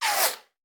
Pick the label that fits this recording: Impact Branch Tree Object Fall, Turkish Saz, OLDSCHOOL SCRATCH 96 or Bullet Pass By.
Bullet Pass By